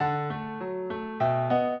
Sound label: minuet, piano; Mozart